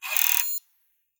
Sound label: Keyless Lock